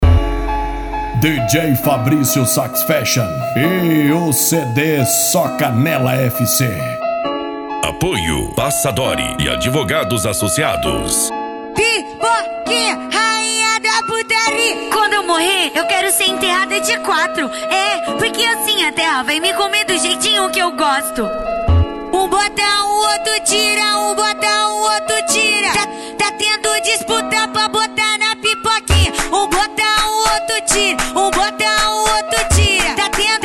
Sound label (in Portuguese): funk e lambadao